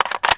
clip in; weapons

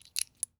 raging bull jiggle

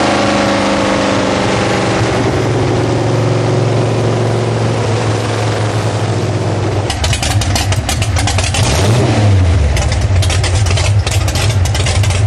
throttle off, vehicles; sgmcars; rat

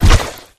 elite bodyfall; flood

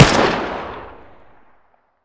sg550 fire

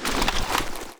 draw rifle; weapons

